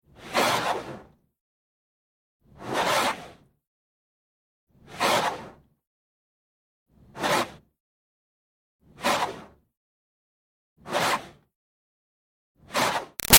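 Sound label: Water Spray Interior Car By Soft Fast; Car Wash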